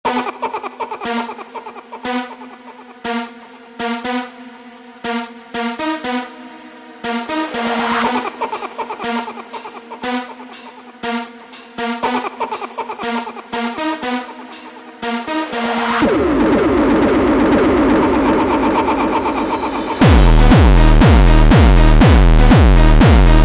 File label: jumpstyle slowed